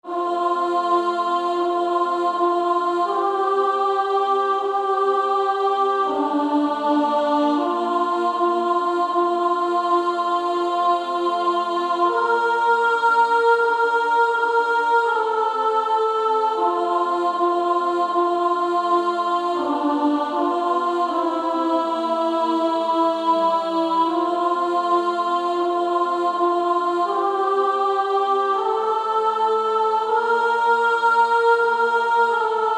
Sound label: Female Choir